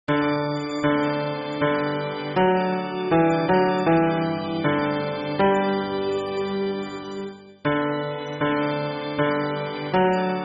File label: hymn bass